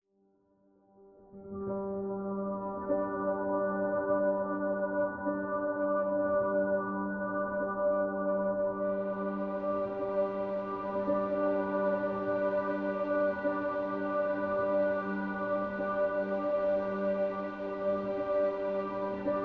Lament Underscore, Piano Noir; Narrative Mind

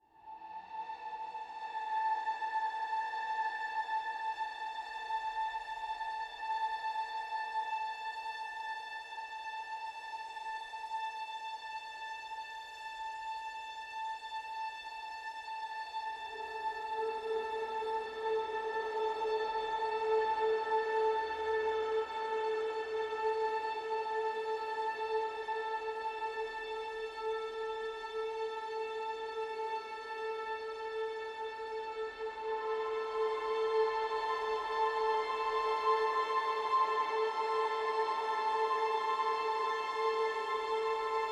Breaking Waves Underscore, Cinematic Textures

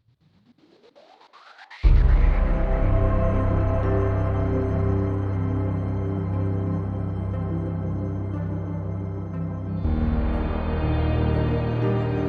Stream Underscore, Percussive Motion; Narrative Mind

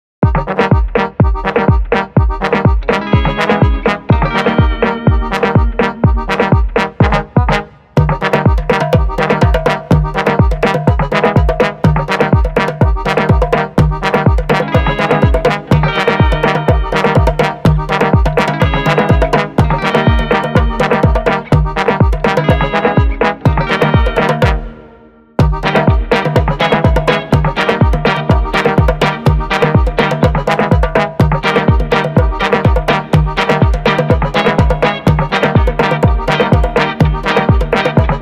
Barrel Dance Underscore, Balkan Brass

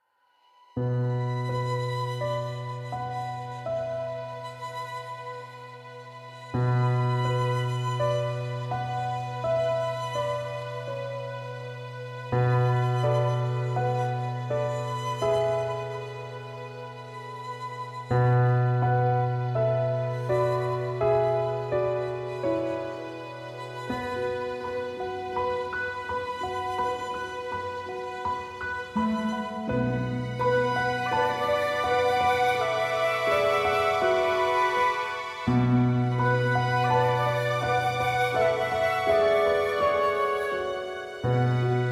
Leaves In The Wind Underscore, Autumn Strings; Narrative Mind

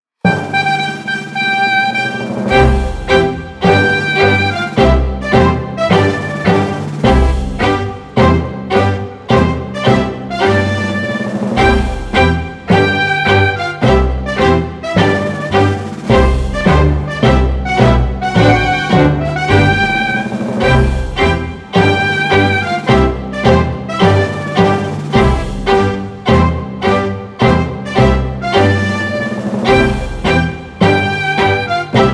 C Major Trumpet Tune; Purcell

